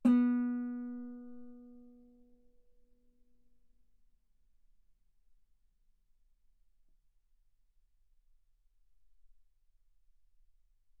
Guitar ff sul B B3

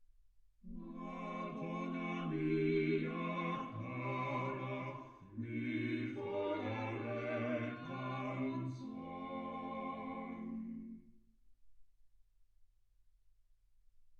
quartet

